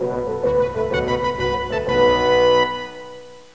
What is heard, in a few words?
fanfare